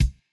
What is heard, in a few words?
kick; Reference Beat BPM120; j city pop 1980